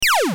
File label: laser